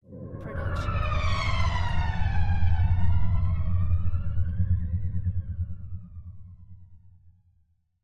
ship fly by

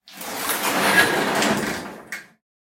Iron Door Open; soundscrate

